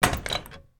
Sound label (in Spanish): cierres baul